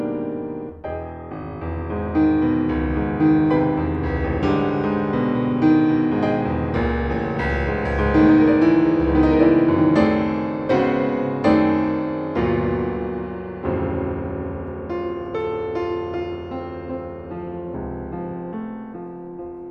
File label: Ballad